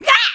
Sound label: Shock Burn; getting shocked or burned; Link; zelda